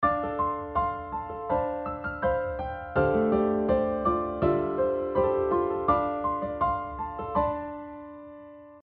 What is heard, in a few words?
modal tritone; Dorian mode; Dm7 add13 or a Dm7 add11 chords; modal harmony; diatonic tritone